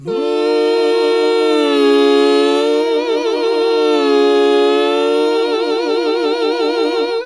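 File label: Gospel; Best Service Gigapack 1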